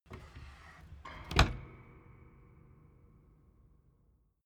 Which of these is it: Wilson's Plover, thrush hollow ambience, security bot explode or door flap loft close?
door flap loft close